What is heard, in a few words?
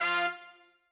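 trumpet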